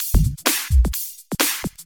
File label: Electro Loop 128 BPM; Vengeance Samples